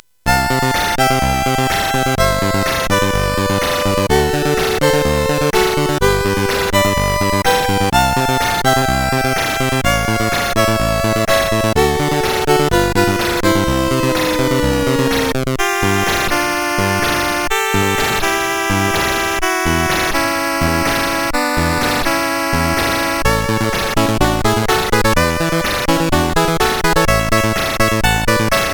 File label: Synth Sample, CSG8580R5; Final Synth Sample II; hvsc